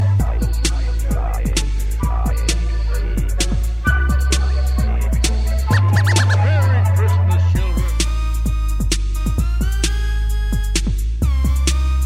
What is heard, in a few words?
christmas melody